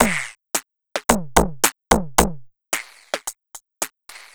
Noise Beat; Drums & Drones

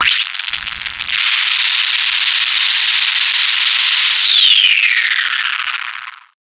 Insectus, Hard & Loud Techno

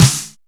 Flange Snares; Percussion; Best Service ProSamples vol.37; Dance Synths

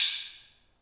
ambient stix, Afro wood

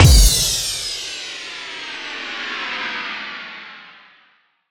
Impact; impact samples

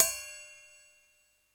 Roland U110 Elec Drums D#2

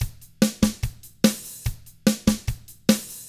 drums, 50s rock; ILIO; Double Platinum Drums 1

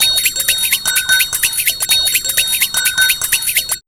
126 PERC, PERC LOOP, Fresh Disco House; USB Soundscan vol.20 - Fresh Disco House I

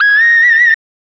SYNTH LEAD; Keyboards; Leads

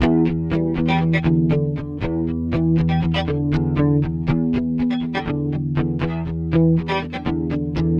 Guitars

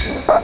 LARGE FX; USB Soundscan vol.24; AKAI; Industrial Loops

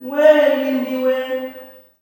"WEY-WEY", MALE; Spectrasonics; Heart of Africa 2; S.MALEAMB

